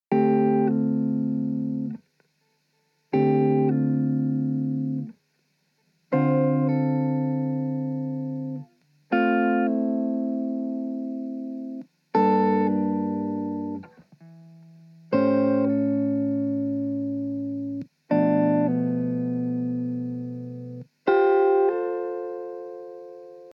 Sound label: Five-Four Guitar Groove